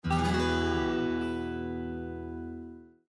Windows Logoff Sound; Windows 7 Sounds; Delta

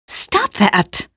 hit, female; Half-Life 2; trainyard

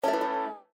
Windows Battery Low; Windows 7 Sounds; Delta

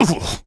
dude augh; Postal Dude Voice Lines

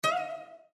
Windows Default; Windows 7 Sounds; Calligraphy

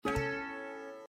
Windows Balloon; Windows 7 Sounds; Raga

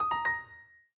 Windows XP Print complete

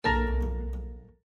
Windows Error; Windows 7 Sounds; Cityscape

